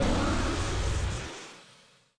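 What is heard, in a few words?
Weapon IR missile release; PC Gamer IT CD 47; USAF; USAFDEMO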